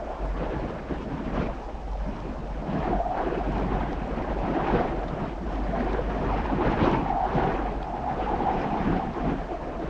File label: loop wind